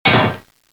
Pioche; bruitage; Outillages

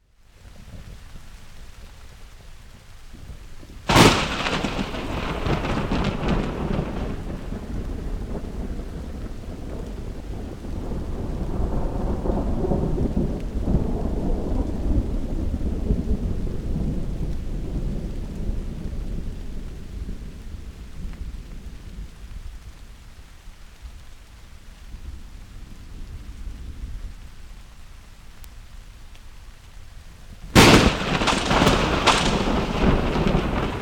Orage; bruitage; Climat

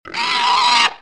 Cochon, Animaux; bruitage

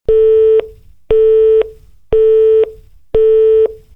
Occupé

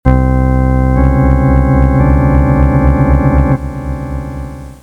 Orgue, Halloween; bruitage

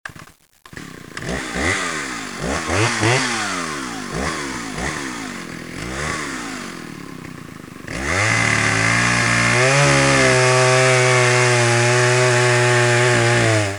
Tronçonneuse; bruitage; Outillages

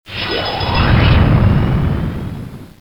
Décollage

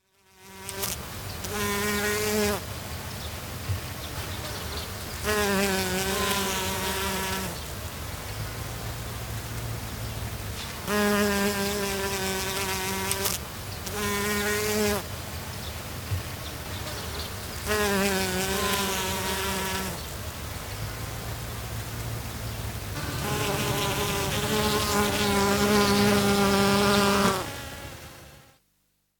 Abeilles; bruitage

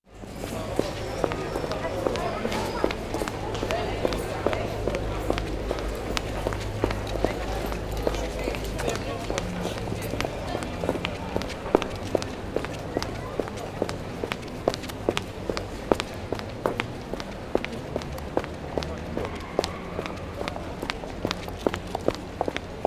Femme marchant vite

